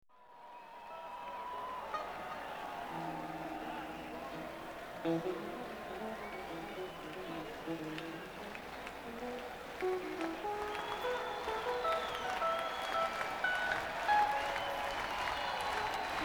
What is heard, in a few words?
Tuning